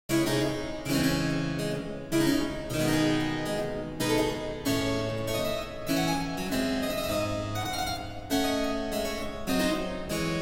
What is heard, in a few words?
Praeludium, Fuga & Posludium